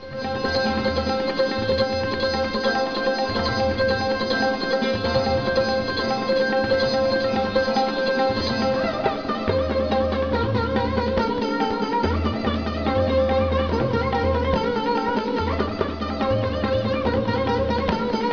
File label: sitar hindi